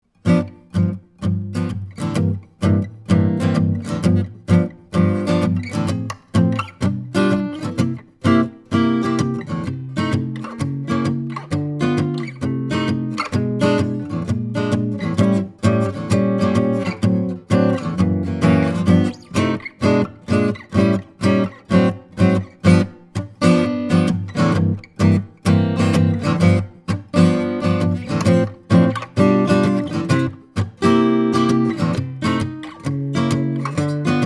Jazz

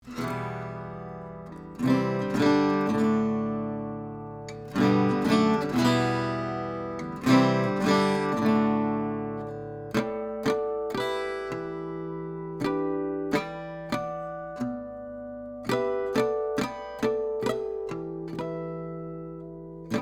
Gibson L50 Harmonic; Harmonics; Original in Em

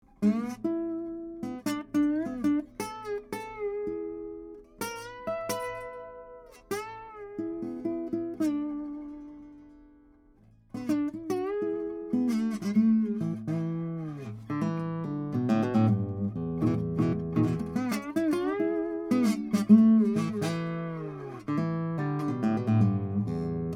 Warm Boogie; Neumann TLM107

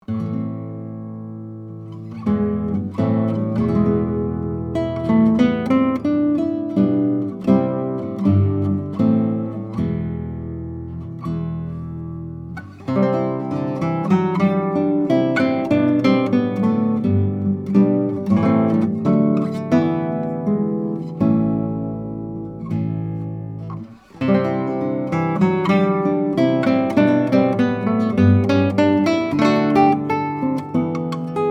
Pavan

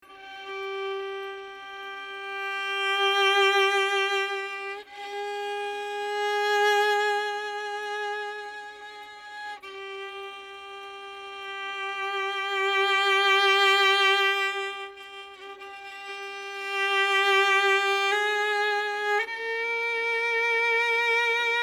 Violin, UM17 tube; AMI

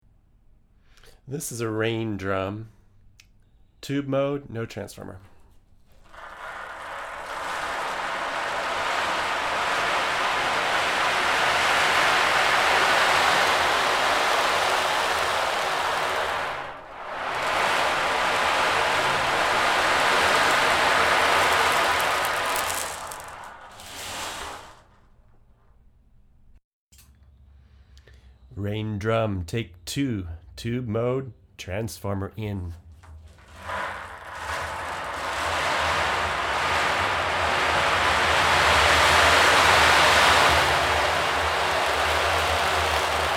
Rain Drum; Millennia STT1

